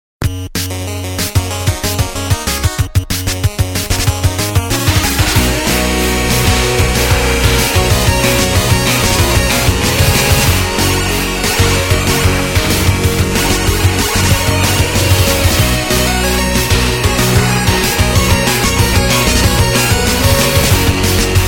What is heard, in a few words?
bitcrushed digital